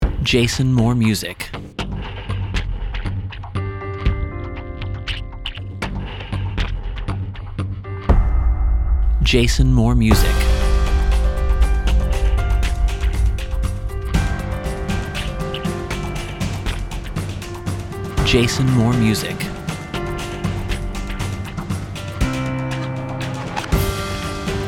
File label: Drum Drop 60